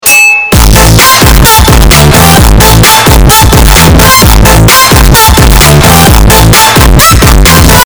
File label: bass boosted; indihome